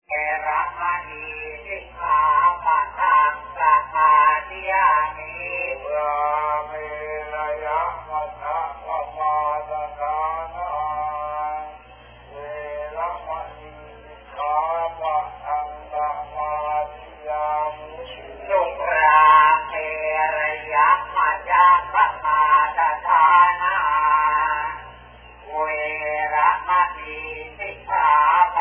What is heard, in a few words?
Monks chanting